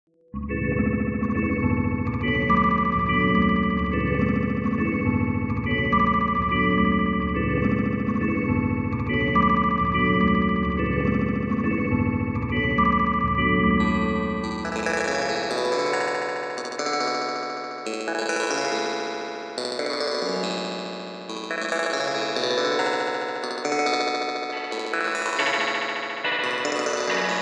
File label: music home other